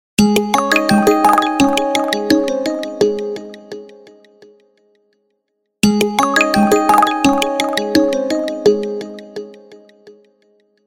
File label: alcatel one touch pouring rain